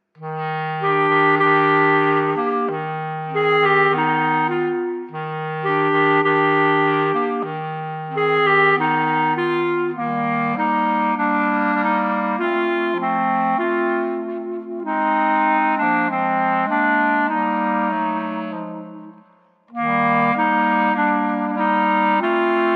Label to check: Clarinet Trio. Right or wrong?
Right